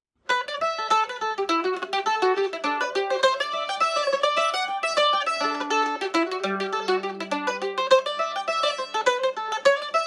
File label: mandolin; caitriona lagan; The Hunter's Purse